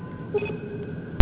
alarm; startrek

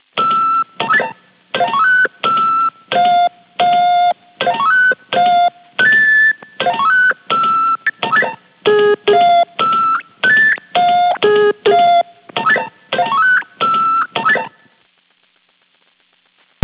helm buttons; startrek

